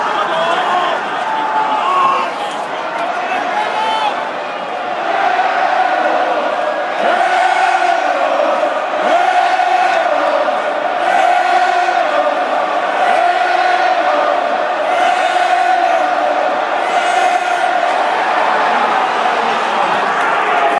chanting; sharks

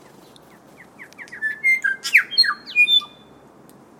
male bellbird korimako

